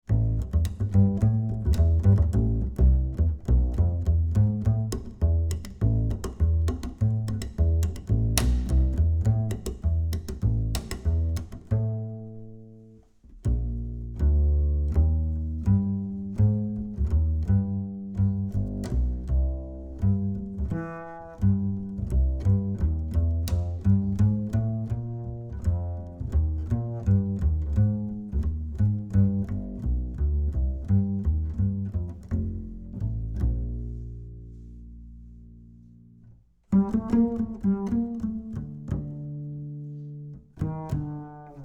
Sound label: Ac Bass 25cm R Plucked Mid